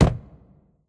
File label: phys cube, physics